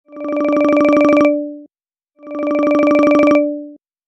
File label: google nexus 6 callisto